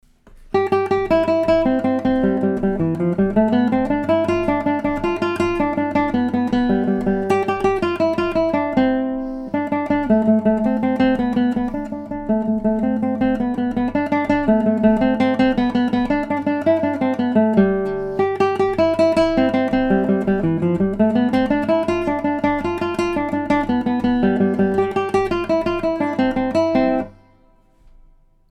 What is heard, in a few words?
Mexican hat Dance